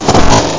landing; car

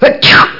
sneeze

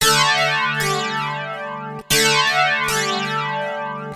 Guit; FUNKIN&GROOVIN'

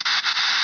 Stutter; PC Gamer IT CD 41; machines demo; Interfer

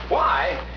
menu popup; nightstalker

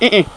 uhh uhh; People; Sound Library 2000; Wayzata Technology

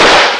bash; charley cat jap cat

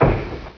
crater Bomb Snd; F.A.R.M. Patrol; MacEasy Magazine CD - Issue 18

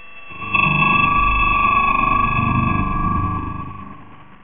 BEAMIN

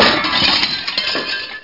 glass breaking

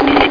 gate; Atari Mega Archive - Volume 1

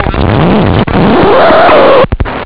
LPCUT, HARDCORE; Groovy Bytes: Behind the Moon; SYNTHIS

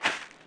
Grassy Step, Footsteps; UrbanChaos

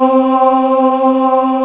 CHOIR AAHS 60; Dark Forces Demo; Dark Forces Instruments

